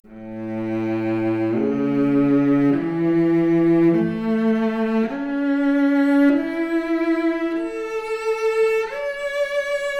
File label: Violoncello arp, Stereo; 4bit